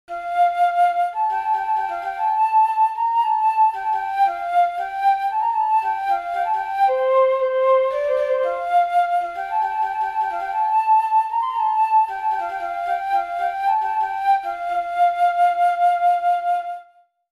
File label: Trills Lovely Flute Generated